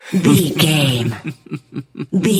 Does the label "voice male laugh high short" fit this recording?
Yes